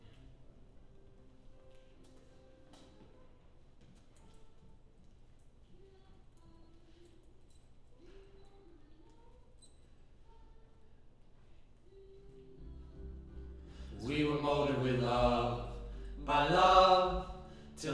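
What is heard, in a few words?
Choir Harmony Low Mid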